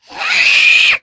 spitter spot prey, voice; source engine; left 4 dead 2

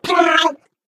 ballbot ping

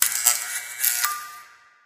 light flicker; Source Engine; Portal 2

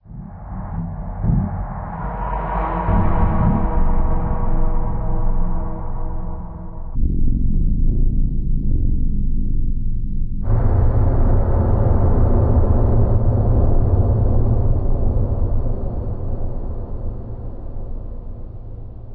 tone alley, ambient atmosphere; video games; source engine; portal2

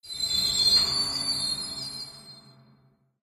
train squeal; video games; source engine; portal2

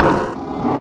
future shoes, player; video games; source engine; portal

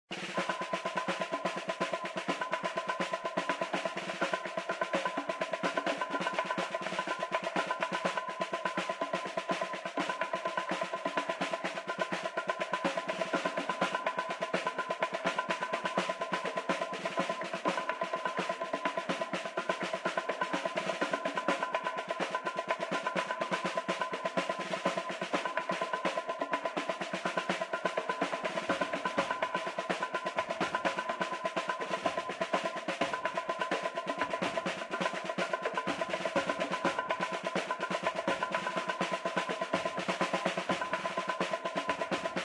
music playing with danger tv; source engine; tf

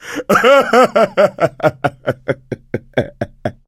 laughter; source engine; left 4 dead 2; survivor

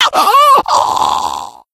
death, infected; Left 4 Dead 2; Source Engine

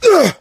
melee swing, player survivor voice

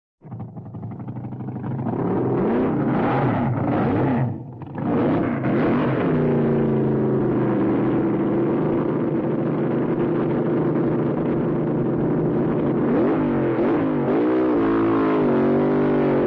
auto, race, dragster idle; cartoon

